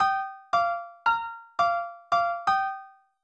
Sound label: main rhythm